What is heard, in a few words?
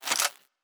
Weapon Foley (Laser); Sci-Fi Sounds